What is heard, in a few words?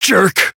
damaged